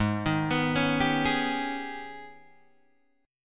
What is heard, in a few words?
Ab Minor Major 11th Ab, Guitar Open G; 6,8,9,6,8,6; down